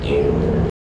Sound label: Pigs Neg High